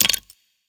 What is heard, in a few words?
gambler mouseclick; DraculasCastleWD; wazdan; ultrafast